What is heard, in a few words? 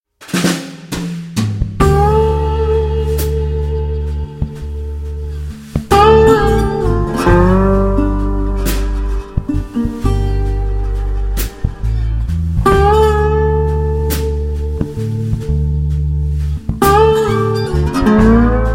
Balkan Blues